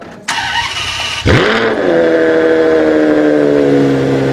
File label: 599 Gto Engine Start